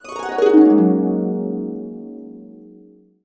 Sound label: GB, Harps; USB Soundscan vol.62 - Complete Harps; AKAI